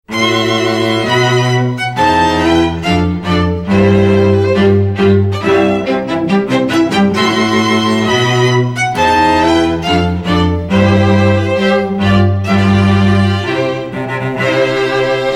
wedding march, classical trio

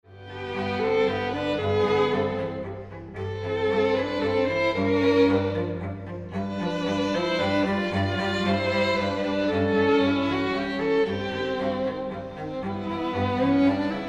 Menuetto, string quartet

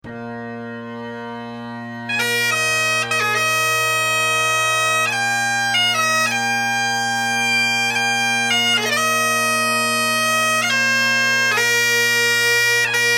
Bagpipes

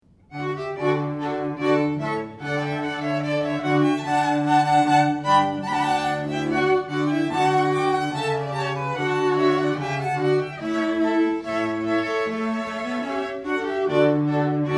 Rondeau, string quartet; Mouret

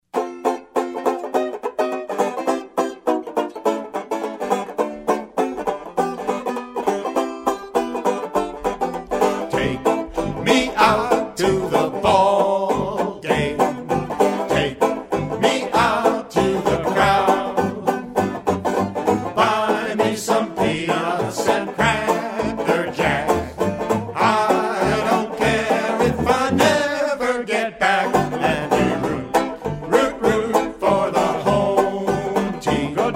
Take Me out to the Ballgame, Dixieland jazz band; 20s band

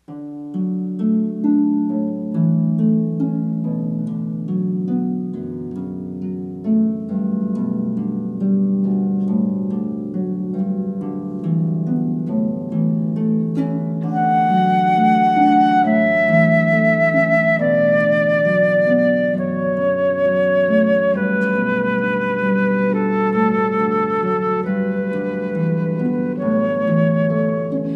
Pachelbels Canon, Flute, harpist

